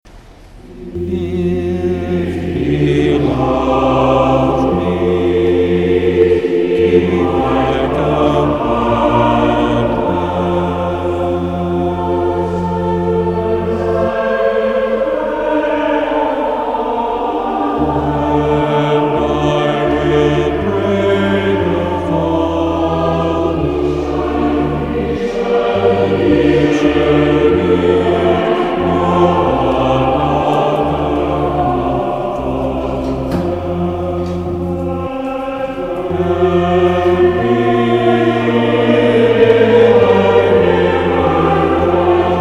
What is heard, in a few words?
Bass